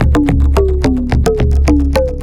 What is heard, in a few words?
drums; Leenkx Examples